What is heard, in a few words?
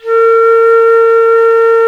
A#3 FLUTE; AKAI S-Series CD-ROM Sound Library VOL-7